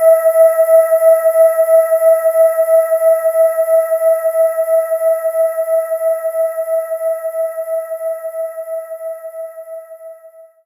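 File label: Synth Vox Oohs F#3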